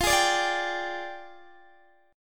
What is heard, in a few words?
F Minor 6th Add 9th F down Guitar Open D